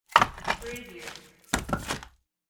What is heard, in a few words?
firewood logs